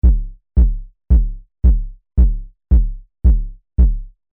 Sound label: Kick Arp VCO Sys 500 Filter